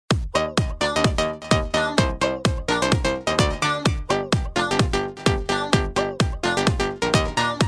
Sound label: Electronik